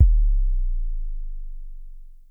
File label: low bass long, Drums, Roland SH-09